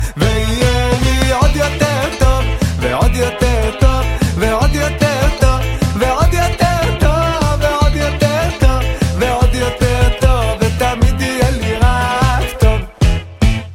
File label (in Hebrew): עוד